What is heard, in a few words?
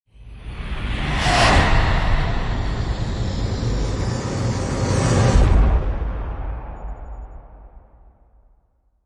Hit Swo Event; Brand X Music; Brand X Music Catalogue - Editor's Essentials